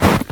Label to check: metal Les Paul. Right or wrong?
Wrong